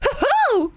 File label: hoohoo; birdo; SM64 CO-OP